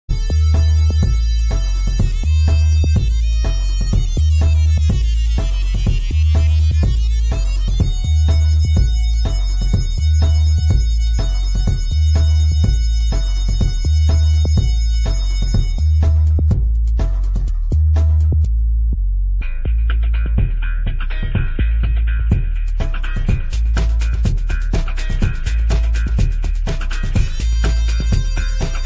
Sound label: Funkadelik Harmonica; Blasteroids